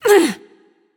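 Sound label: grunt hit, female; smite; voicepack; hera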